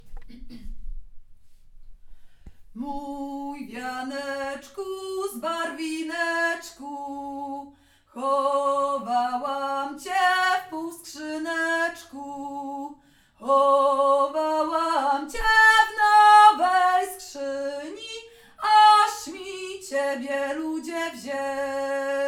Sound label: folk song weselny; mapyopowiesci